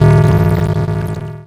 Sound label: Production Element Title Transition Liquid